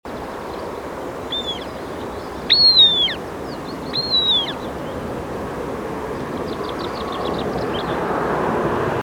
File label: Hen Harrier Circus cyaneus, Ladakh